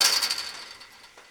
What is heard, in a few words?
fence; casualtiesunknown